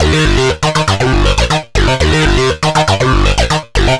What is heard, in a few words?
Synth